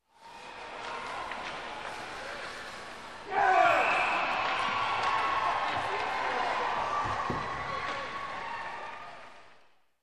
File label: Crowd in a coliseum distant